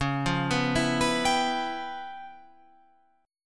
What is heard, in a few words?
C sharp 7th Sharp 9th Flat 5th C sharp Guitar Open F; arpeggiated; C#7#9b5; 13,12,11,11,14,14; JGuitar